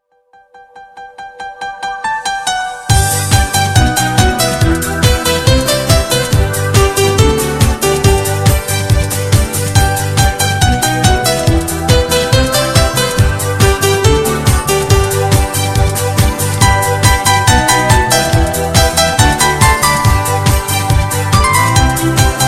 Melody

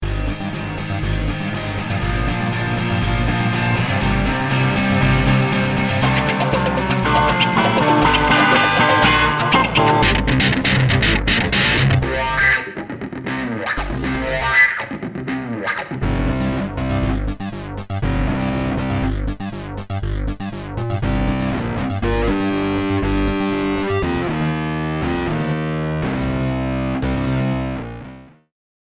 Rock Tone

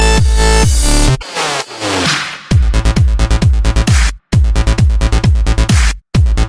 New Bass Sms

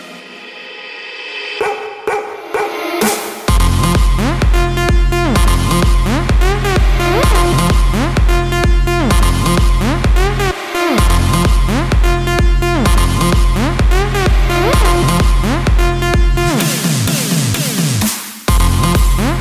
Wolf Tone

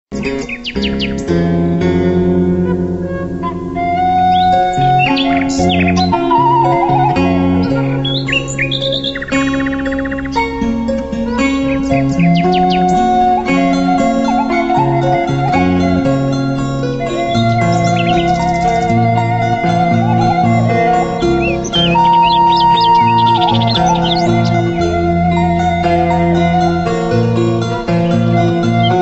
Asia Music

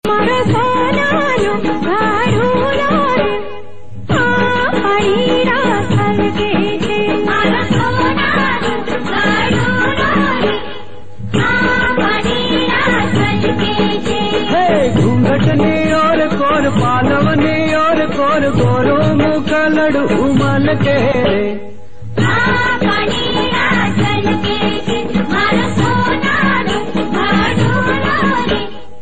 Gujrati Tone

